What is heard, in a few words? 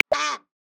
Egyptian Goose; Xiaomi Redmi-Note-8-Pro; Africa